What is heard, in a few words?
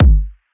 Fat Kick